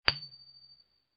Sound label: Coin toss